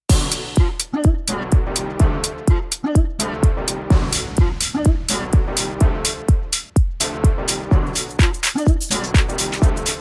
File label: Preset Future House